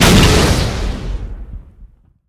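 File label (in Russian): утилизация старой мины во дворе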